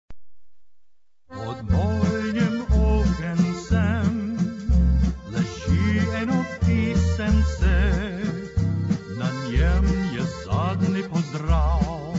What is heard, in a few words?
slovene waltz, polkas